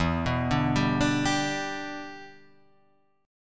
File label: E Minor 7th, down; arpeggiated